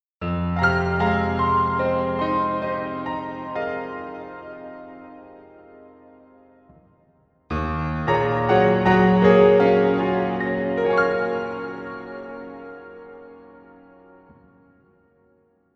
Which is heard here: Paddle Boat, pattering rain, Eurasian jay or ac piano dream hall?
ac piano dream hall